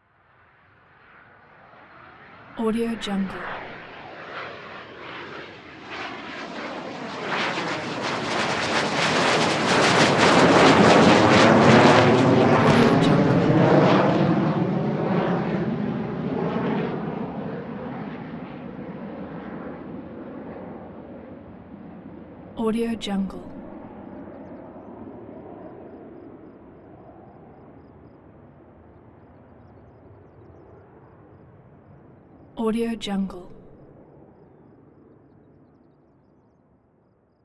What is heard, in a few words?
jet take off; blast drive 30; prosoundfx